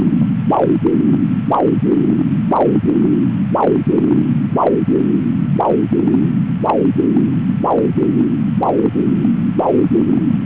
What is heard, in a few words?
car long